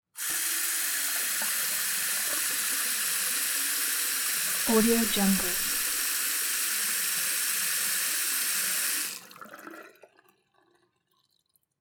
household sink aluminium small water run plughole drain